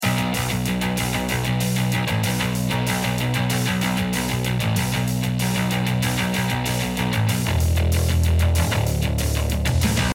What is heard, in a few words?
start round, music